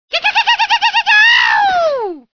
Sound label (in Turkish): Komik Whatsapp Bildirim Sesleri